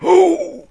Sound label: dead guy noise; Deathground 1.2 Demo; New York